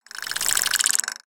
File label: Broombot Whirl, creatures mechanical; Inventor Broombot